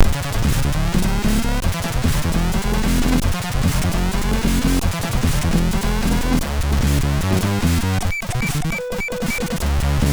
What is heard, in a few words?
Test, MOS6581; hvsc